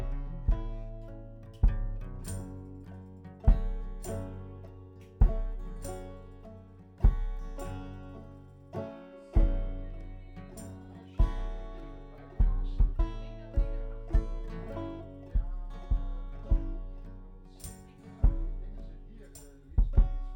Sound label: Chordz